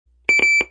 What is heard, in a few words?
Double, BEEP; noisetoys